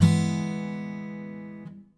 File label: Feel Blob Note; Feel Demos